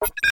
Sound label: multimedia Click Electronic; little robot sound factory